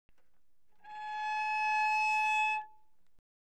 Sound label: Cello arco pp sul A Ab5; Strings